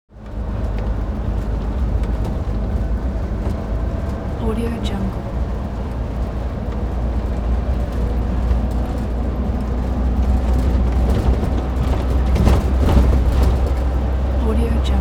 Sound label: bus interior constant driving; hollywoodedge